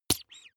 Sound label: bath duck hit soft